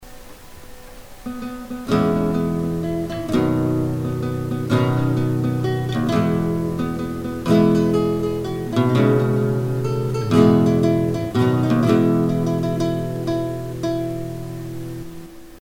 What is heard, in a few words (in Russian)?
happy birthday na gitare